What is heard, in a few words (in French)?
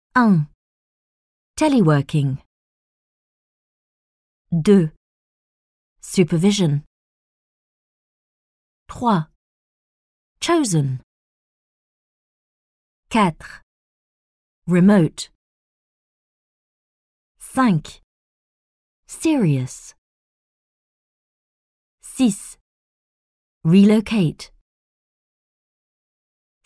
son techno